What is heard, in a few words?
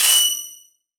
alarm; Heaven Studio Plus; nail Carpenter